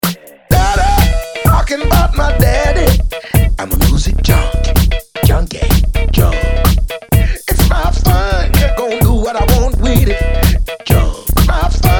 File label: Funk Remix